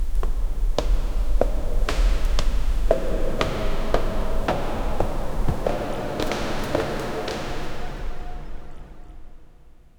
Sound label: ancient realm palace corr